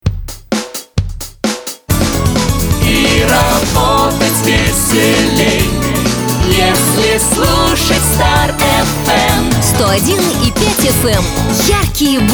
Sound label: radio jingle; ru-starfm